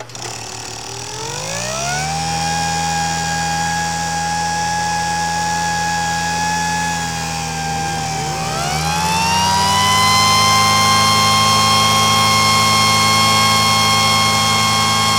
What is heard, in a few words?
paint dry, Motor Misc; SFX